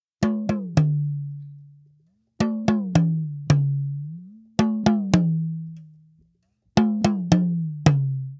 Talking Drum, African

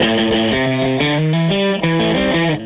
Les Guitar Loop

